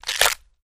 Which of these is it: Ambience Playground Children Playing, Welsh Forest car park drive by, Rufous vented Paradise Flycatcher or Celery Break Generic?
Celery Break Generic